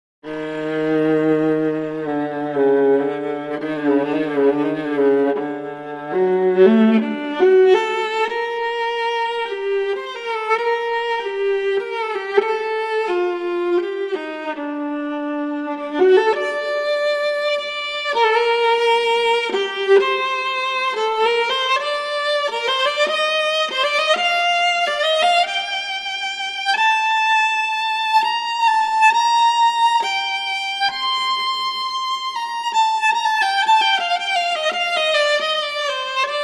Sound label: viola; virharmonic